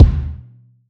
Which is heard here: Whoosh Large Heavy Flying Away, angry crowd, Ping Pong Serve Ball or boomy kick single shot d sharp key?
boomy kick single shot d sharp key